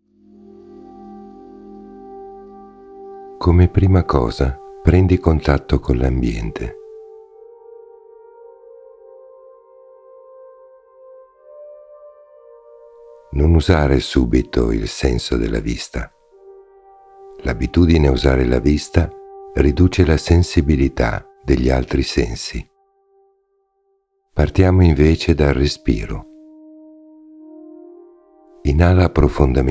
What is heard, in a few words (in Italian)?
Meditazione Dinamica NATURA